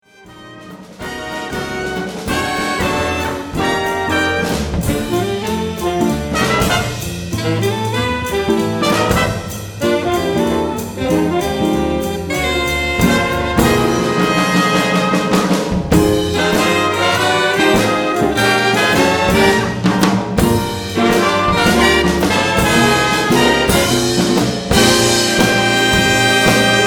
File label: Bigband